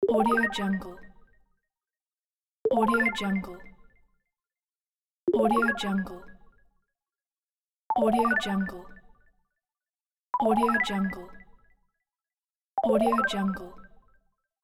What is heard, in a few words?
points